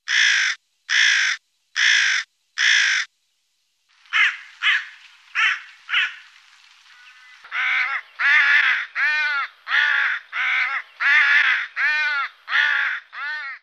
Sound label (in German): Aaskraehe Rufe; Rabenvogel